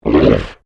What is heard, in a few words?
Monster Grunt, Horror, Monster, Grunt, Creature; Monsters; BlastWave